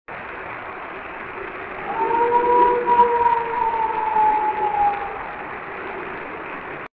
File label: Florida howl